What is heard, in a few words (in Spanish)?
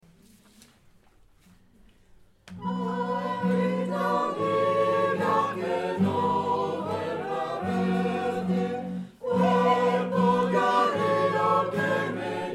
Ay linda amiga